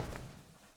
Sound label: Submarine Step